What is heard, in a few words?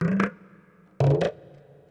PERC LOOP ETHNO 125; eJay